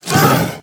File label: Spider Grunt General Scream Start Combined